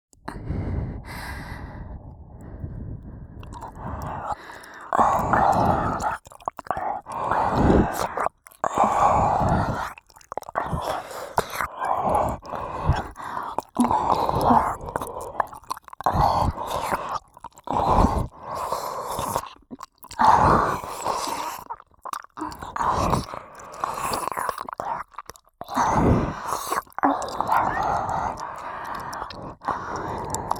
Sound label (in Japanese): 両耳 仕上げのおそうじ耳舐め♪